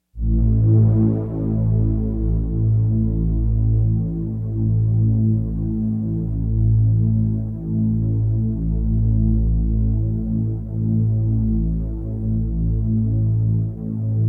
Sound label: drone